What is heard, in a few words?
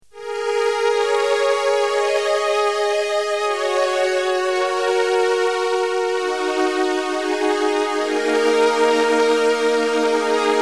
jh3200 ensemble; polykorg